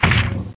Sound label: PANEL; CAESAR3